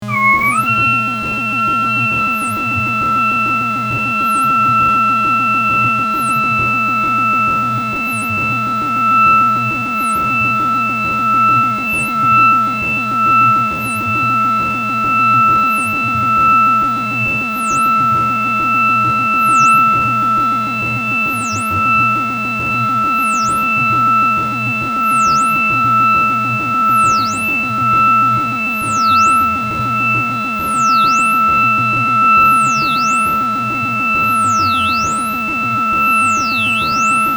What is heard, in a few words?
rage signal